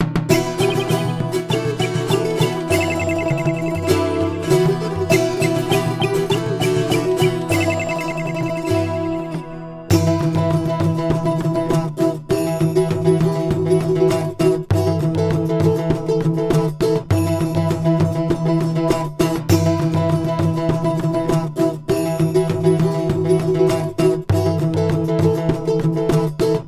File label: bgm pot ethnic; Ace Attorney 6; Phoenix Wright Spirit of Justice